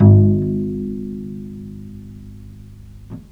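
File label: VIOLE PIZZICATO G#3, Historical Instruments; USB Soundscan vol.23; AKAI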